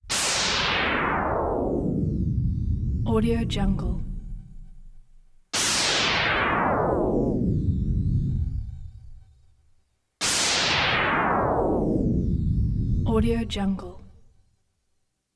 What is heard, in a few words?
synth zap tones descending throbby